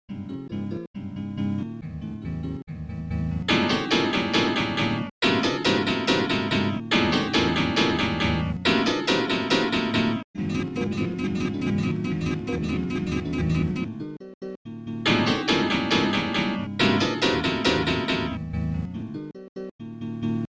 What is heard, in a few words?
Guitar ACCOUSTICS